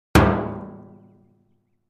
Metal 50 Gallon Drum, barrels buckets & cans

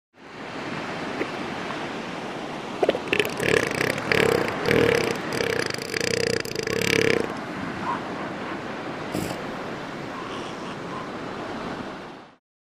Seal Elephant Growl

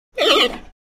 Panda Bleat Single

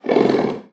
Leopard Growl Inhale; big cats

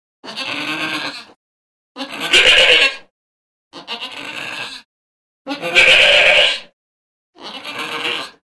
Goat Multiple Strain, goats

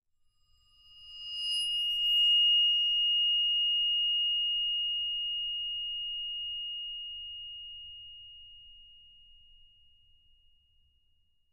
Tibetan Bell Bowed

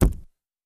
Camera Flash Pot De, cameras; Camera flash, flash pot, deeper, less explosive

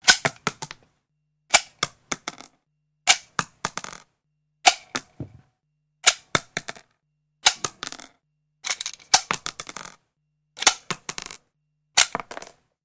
Eject Ammo From Clip, handgun

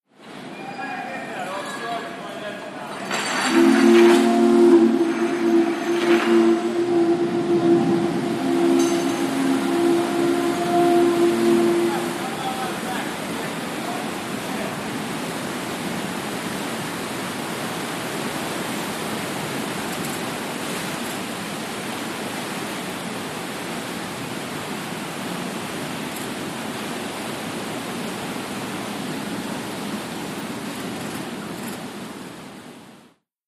Ferry Horn Blast

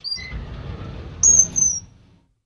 Drawer Metal Open Qu; drawers

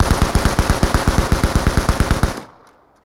Uzi Rapid Fire Burst

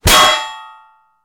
Bullet Impacts Metal

